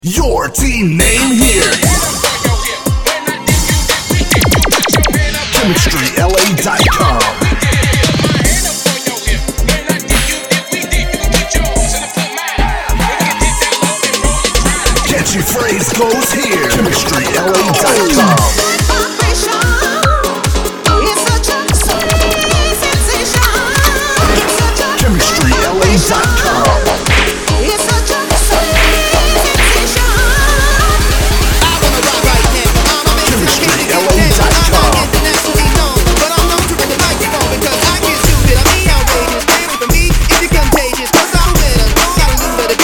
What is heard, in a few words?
Premade Cheer Mix Old School